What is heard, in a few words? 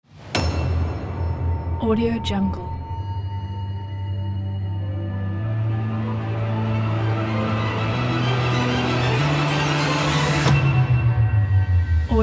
epic orchestral rock trailer; apd-sound